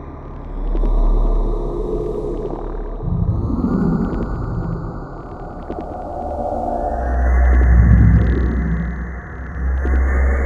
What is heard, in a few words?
Gate Atmos; Biomass